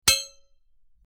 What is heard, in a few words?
Metal clank